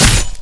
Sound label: attack